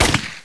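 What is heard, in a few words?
gunshot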